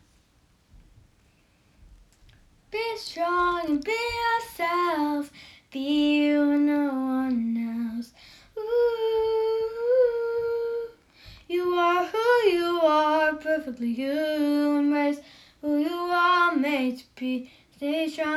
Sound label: music